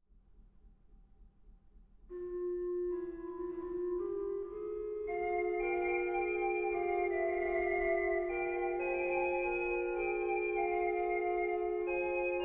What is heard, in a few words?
Dandrieu Magnificat Recit, organ; ebersmunster